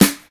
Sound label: dry snare sample g key; snare drum samples